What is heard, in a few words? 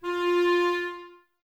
clarinets, Wood Winds